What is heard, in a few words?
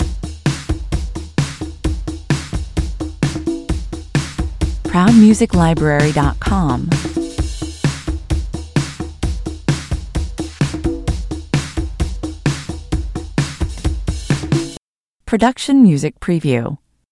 elektro underscore version drum loop